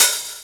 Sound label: DR. LOOP, DRUMS; X-Static Goldmine 3